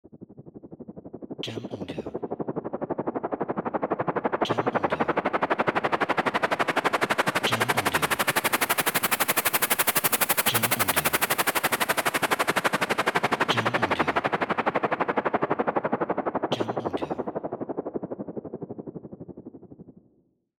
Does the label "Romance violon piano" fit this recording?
No